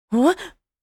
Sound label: Surprise sigh anime boy vocalization